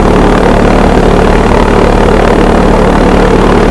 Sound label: motor, airport